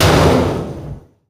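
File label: panels impact large, metal; physics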